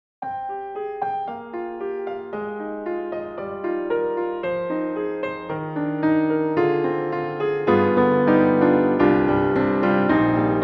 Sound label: Black Grand Cathedral; VI ONE